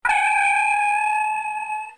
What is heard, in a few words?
com beep, computer